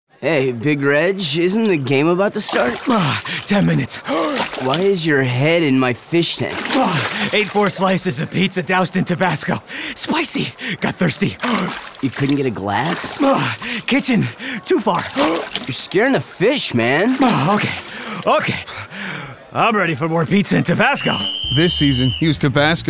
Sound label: Underwater Radio